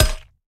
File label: Weapon Ceramic